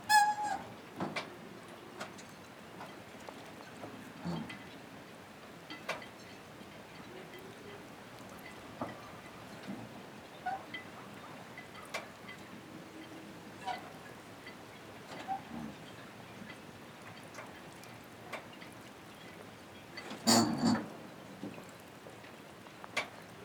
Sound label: Marina, Docks, Floating Pier, Deck, Waves, Wind, Sail Flap, Flags, COSTAL SPAIN; Creaks